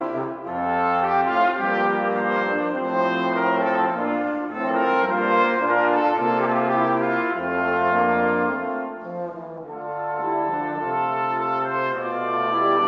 Liebster Jesu, wir sind hier - Praeludium

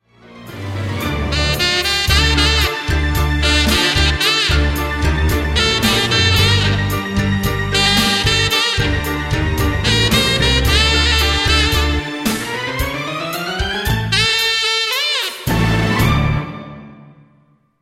Eroeffnungsmusik Playback

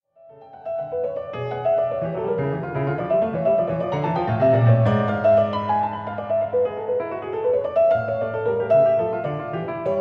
Praeludium D-Dur; JS Bach; WTK Band I

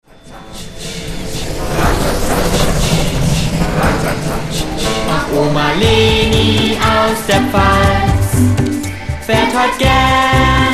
Kinder- und Teeniechor

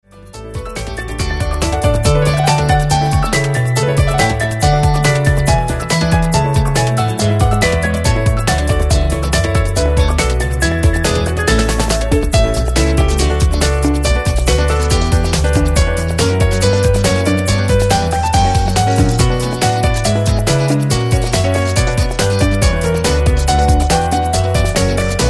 Gavotte aus Suite Nr 3 in D-Dur

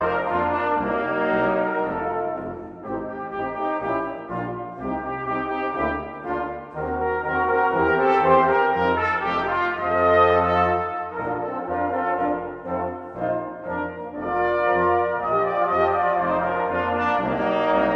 Tanz der Rohrfloeten

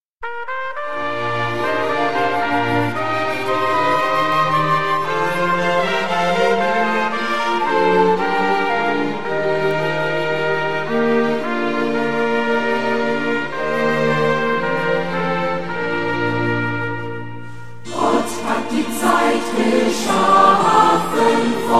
Chor

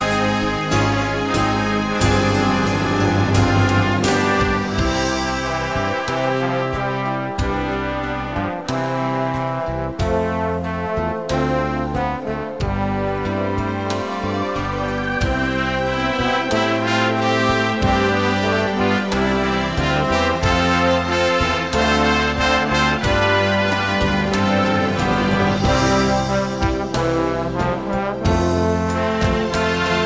Anbetungslieder-Potpourri, brass

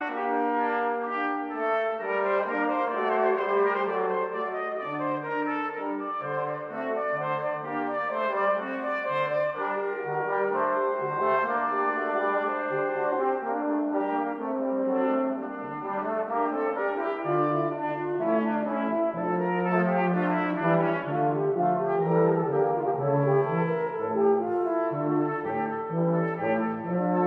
Fuge g-Moll fuenfstimmig

